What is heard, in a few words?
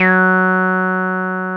TB 303